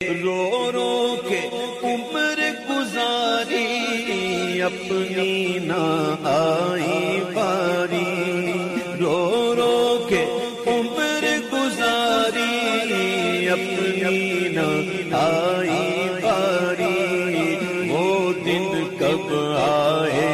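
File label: Heart Touching Naat Ringtone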